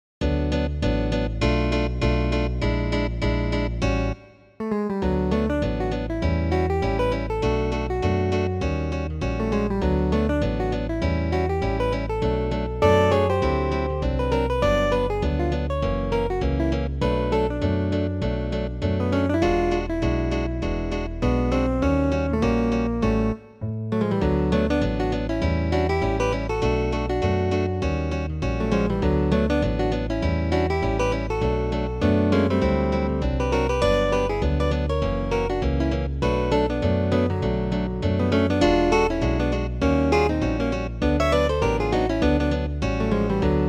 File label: Swing Pour Un Ami